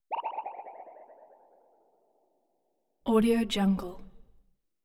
descending echo blip

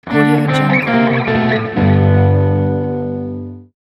game over

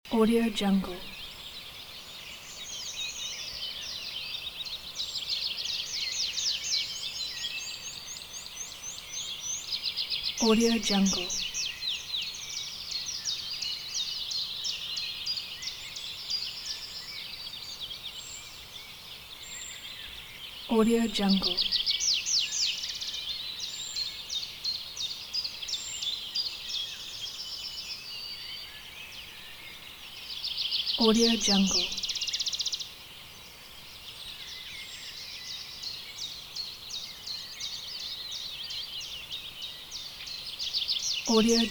spring forest birds loop; fxprosound

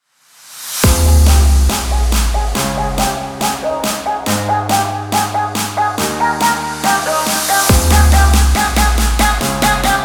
future bass

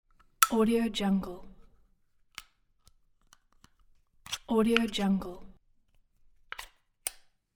food can opening

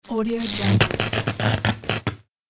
electrical current

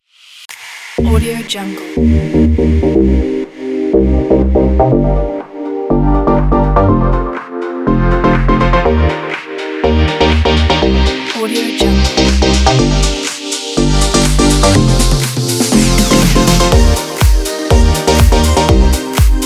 deep house

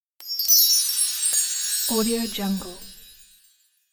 sparkling cinematic transition

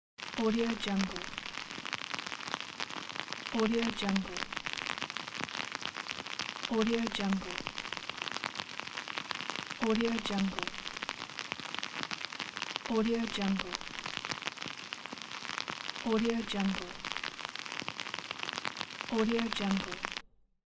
vinyl record player crackle

